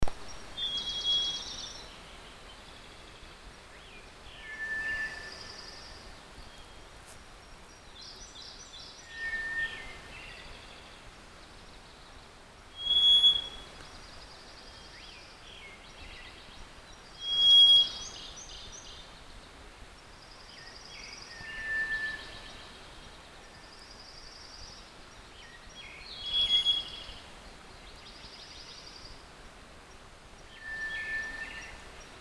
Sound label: thrush white Zoothera aurea